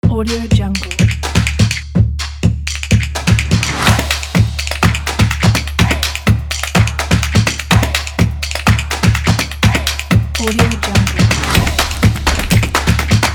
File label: intro logo